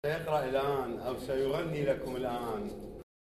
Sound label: loop